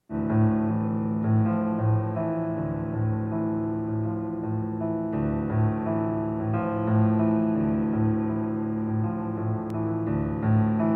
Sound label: Soundscape (Solo Piano; Live at Creative Music Studio, Woodstock, NY